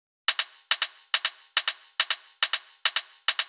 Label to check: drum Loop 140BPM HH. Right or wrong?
Right